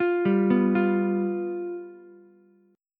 G 7th G down Ukulele; G7 arpeggiated; JGuitar

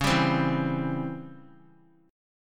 C sharp Minor Major 7th Flat 5th Guitar; C#mM7b5 strummed; x,4,7,5,4,x; JGuitar

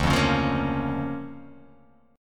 D Minor Major 7th Sharp 5th, D

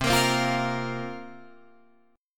C 7th Suspended 4th, Guitar Drop D, chord

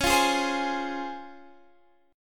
Db Major 7th; strummed

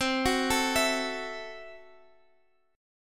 C 6th Flat 5th, C, down, Guitar; C6b5; arpeggiated; 4E,4A,5D,5G,5C,6F

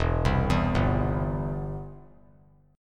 E Minor Sharp 5th E down; Em#5 arpeggiated; JGuitar

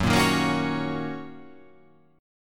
Fsharp 6th down, chord

